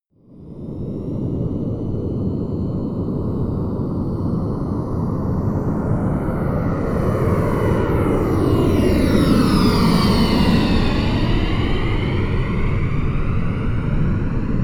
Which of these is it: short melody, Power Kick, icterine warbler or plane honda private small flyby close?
plane honda private small flyby close